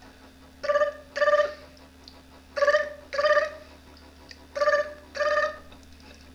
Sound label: rig phone